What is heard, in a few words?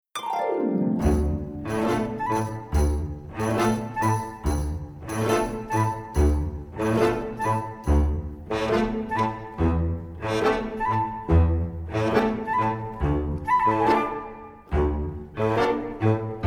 tango audition cut accompaniment